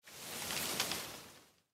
Giant Willow Tree; Blind Quest - The Frost Demon

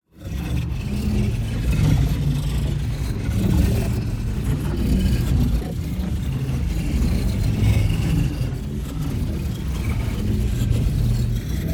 TEXTURE Mechanical Stutter; boom library; Matter

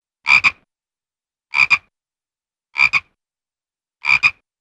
Single Frog Croak; Cartoon; Hollywood Edge; Cartoon Trax

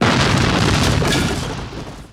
Explo Medium Debris; Destruction

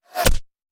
Bullet Impact; Sound Ideas; Guns

